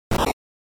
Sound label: SNES RPG Damage